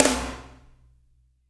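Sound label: snare, right; Spectrasonics; Burning Grooves CD2; HIT ME KIT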